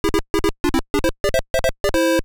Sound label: GAME START; Choplifter; atari 7800 gamerip 1987